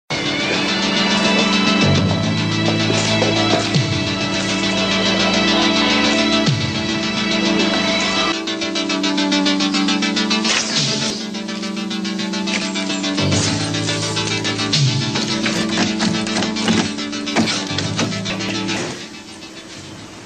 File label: dramatic theme